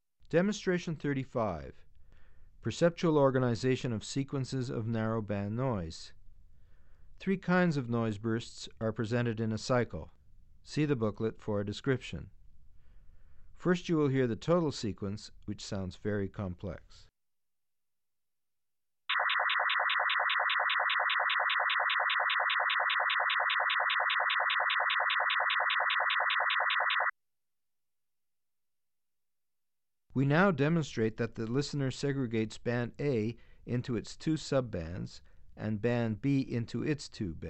Perceptual Organization Of Sequences Of Narrow-Band Noise; Bregman ASA; auditory demonstrations